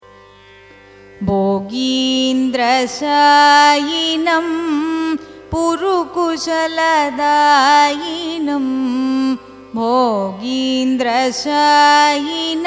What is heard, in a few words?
raga kuntalavarali carnatic; carnatic music